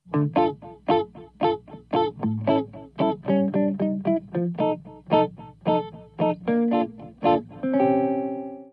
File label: Riff